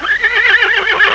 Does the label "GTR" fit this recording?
No